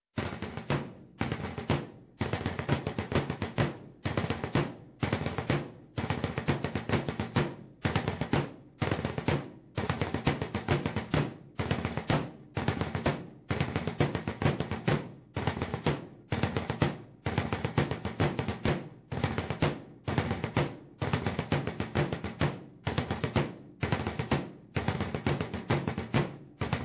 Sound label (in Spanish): romper la hora, tambores; SAMPER